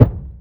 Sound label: thud; Totaled; XBOX